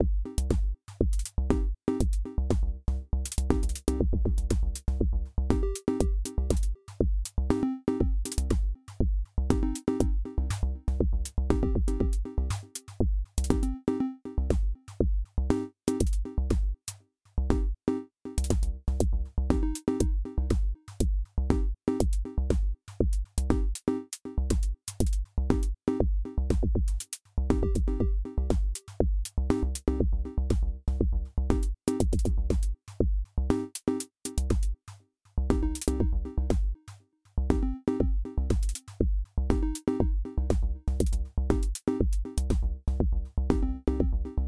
sum FX Kompressor

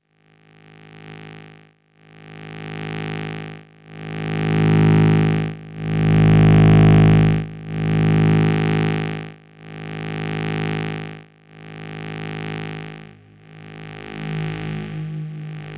Soma Pulsar 23 Patch Passives Hochpassfilter

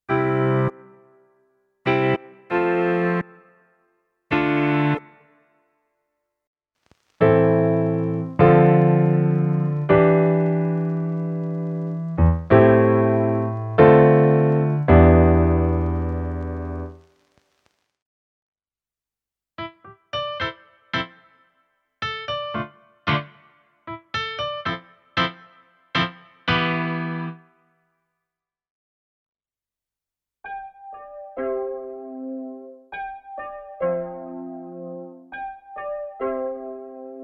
Piano Variationen; Roland Go Keys

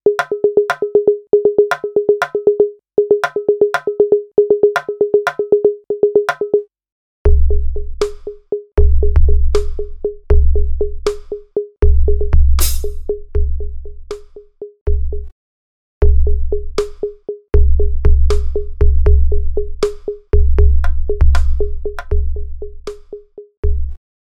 KI MIDI Generatoren Staccato Simple EDM Track with beats bass and chords

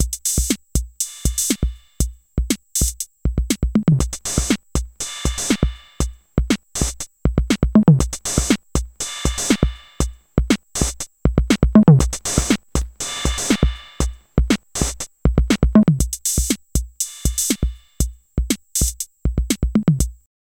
Drums mit Effekten Overdrive

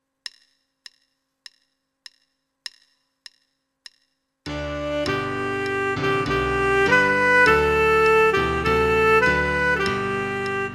Recorder App Lernen Tenor Sax; Artinoise

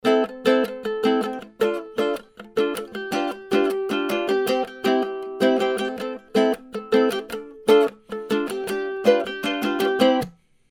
Shure SM4 mit Popfilter Ukulele

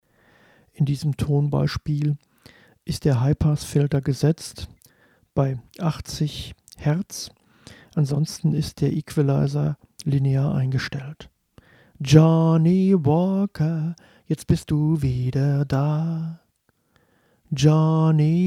Akustik Duo; selbst abmischen

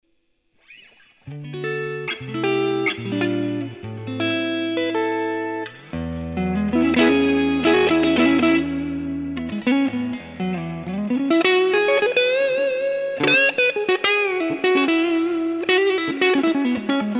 Fender Blues Tweed Hall

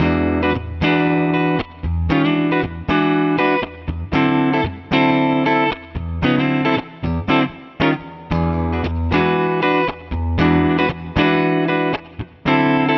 Gitarren Plug ins im Vergleich Warm Clean; S-Gear

